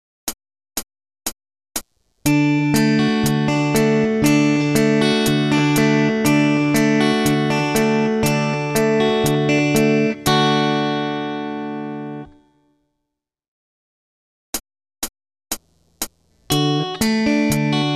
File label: Rhythm Guitar